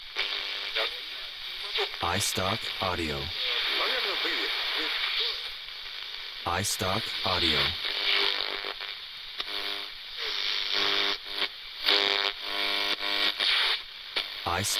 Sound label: am radio tuning